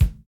kick, house

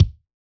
Hiphop kick; Reverb+EQ+Comp+Limit

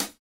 hihat, house

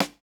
snare, snare house

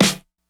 snare; funk

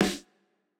Jrock snare

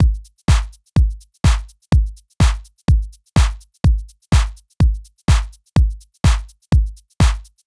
chillhouse loop 125; Electro

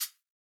Modern hihat, Electro

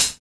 hihat, house

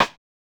Modern rimshot, Electro

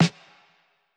snare, lofi hiphop; Legendary lofi hiphop

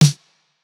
pop snare; japanese pop

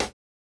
snare, snare house

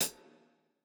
hall resonance hihat

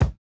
kick, house

